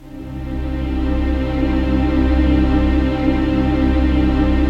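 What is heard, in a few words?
trance pad; Best Service; XX Large - Pads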